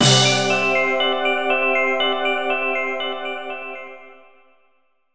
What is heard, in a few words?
Jiggy Appears; Banjo-Kazooie HD; N64